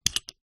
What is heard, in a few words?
Metal Sliding Boom Adjustment; sound spark